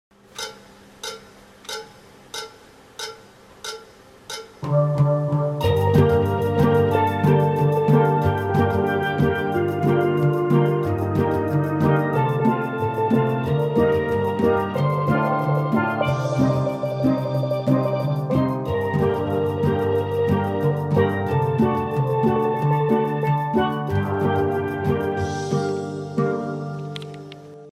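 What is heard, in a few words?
TUTTI SOLO TENOR 92, Steel Band; BELLA CAO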